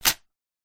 paper tear

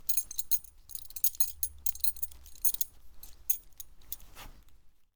belt

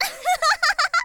girl ahahaha; voice

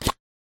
small wooden box open

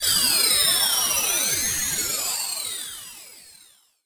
aura, battle

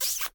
kiss

